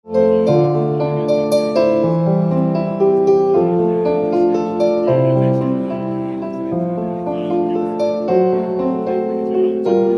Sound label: pianist; leicestershire